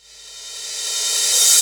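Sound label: crash reverse, Dance Mega Drums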